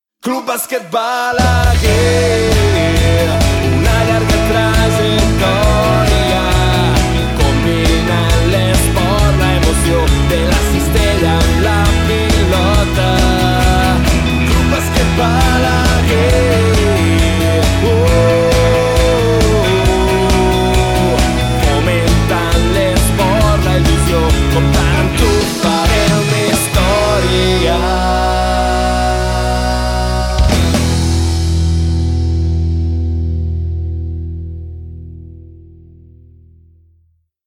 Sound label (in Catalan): Himne jingle; CB Balaguer